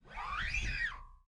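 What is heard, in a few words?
6-string fretless bass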